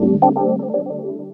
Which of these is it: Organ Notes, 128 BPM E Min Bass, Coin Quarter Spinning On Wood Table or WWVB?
Organ Notes